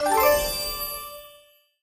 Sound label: respin number; games; Aztec Gold Megaways